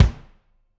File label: room Kick, drum